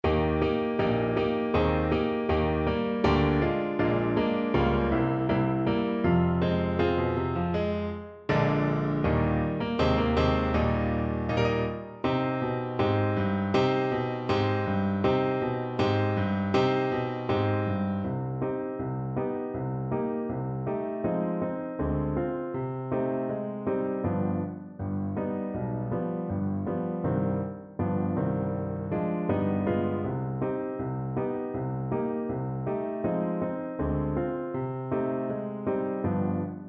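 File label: alto saxophone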